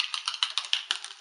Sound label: marbles, drum loops